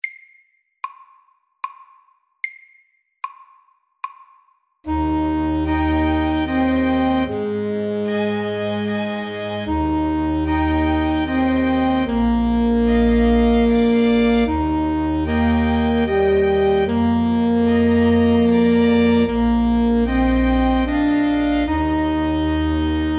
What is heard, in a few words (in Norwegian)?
Minivals MEDIUM 75